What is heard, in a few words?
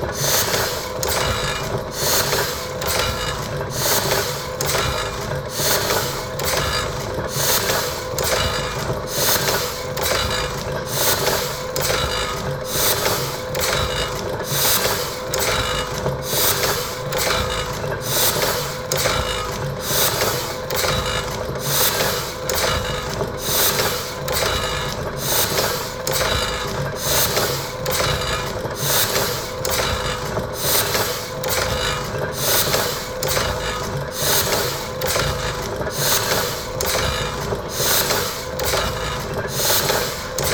Printing Press, Fast, Grinding, Hydraulics, Whirring, Heidelberg Printing Press; Bombay Sonic